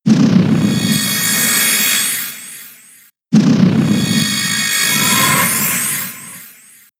Healing Wish; Pokémon SFX Gen 6 Attack Moves; XY ORAS

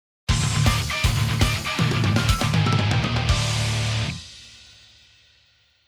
Song Bonus; Friday Night Funkin' The Origami King

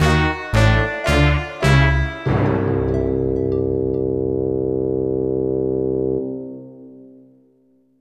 Lose; Rush Herbal Assault 01

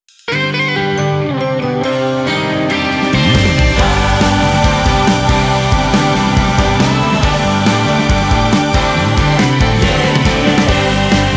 Music Vocal; the king of fighters for girls; android

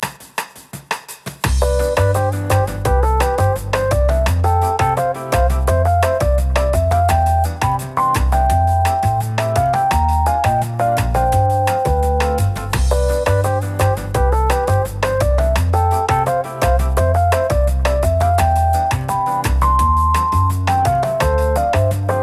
BGM SHOP DRESS; Girls RPG Cinderellife; 3DS

